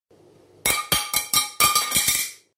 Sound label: bathroom piece dropping